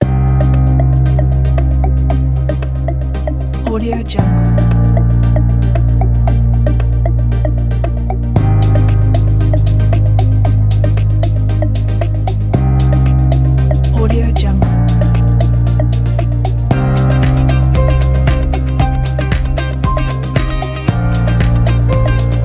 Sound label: pop piano retro